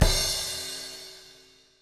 CYM, DRUM ZONE; Masterbits - Total Zone

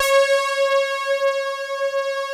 OBX PAD C5, Analog Fantasies; USB Soundscan vol.49 - Analog Fantasies; AKAI

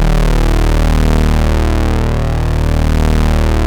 OB D#2 -L, Rare Synthesizer; Hollywood Edge - Giorgio Moroder Rare Synthesizer Collection; OB 4 VOX.

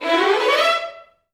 VI RN MI E4, Advanced Orchestra; Best Service

